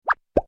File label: show